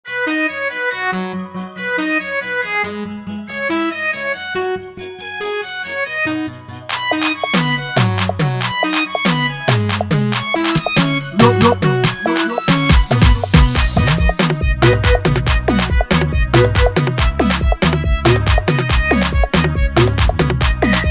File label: Jingle; Lotus Beatz; Ogba